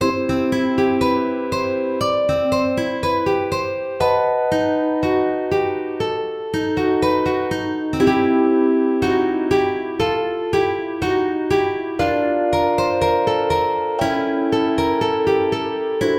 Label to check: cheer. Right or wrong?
Wrong